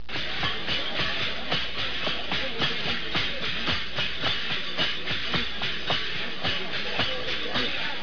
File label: musical